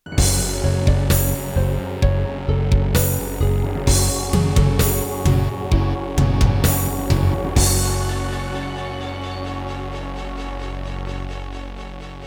Introduction, Serdaco Dreamblaster X16GS NATIVE; Doom (Sigil)

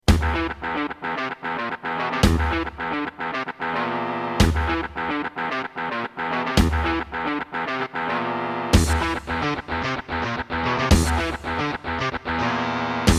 Roland SCB-55; Doom

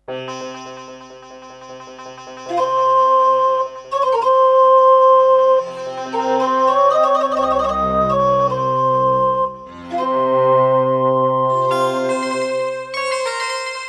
Roland SC-55; Prince of Persia 2

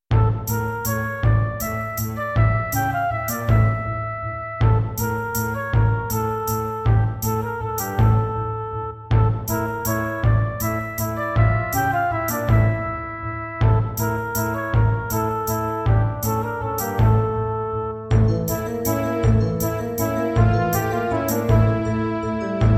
Roland Edirol SD-20 GS MODE

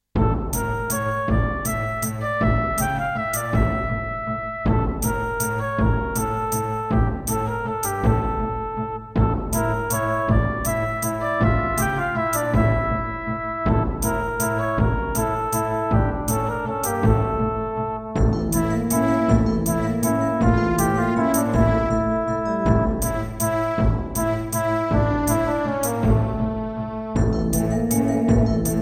Roland PMA-5

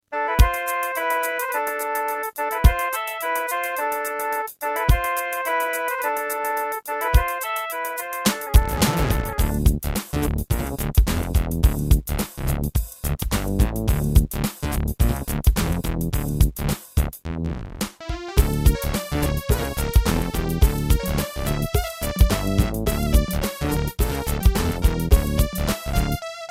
Terratec MiniWaveSystem TTWT; Lost Vikings

